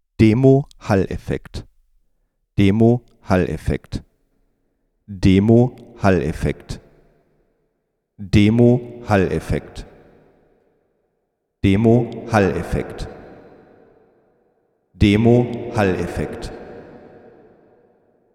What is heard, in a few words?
Zoom V6 Vocal Processor Hall